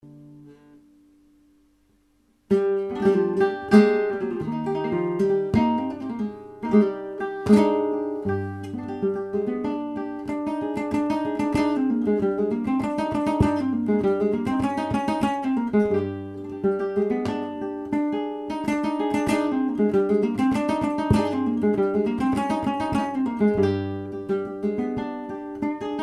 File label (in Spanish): solea